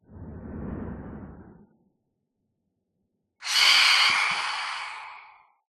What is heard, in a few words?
swoop, phantom; mob; Minecraft